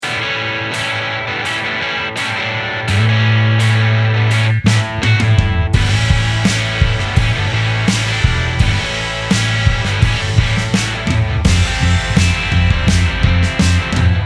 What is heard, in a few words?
tube burner, pedal board; logic pro